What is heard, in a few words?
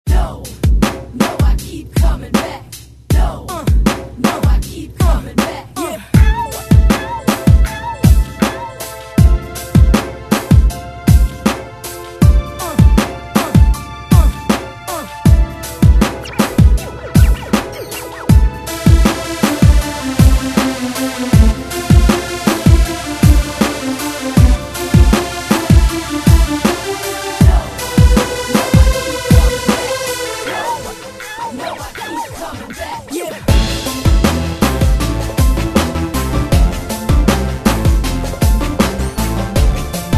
hip hop